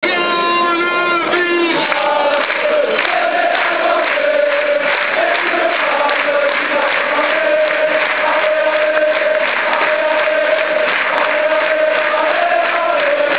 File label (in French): Quand le virage se met a chanter